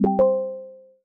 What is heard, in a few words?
Notification; Emberfall